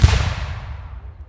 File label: cannon Noise; Soulforge Tower Defence